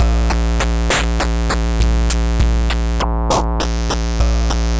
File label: Robo Beat 100BPM, sci-fi Beats